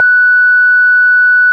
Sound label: ORG, Farfisa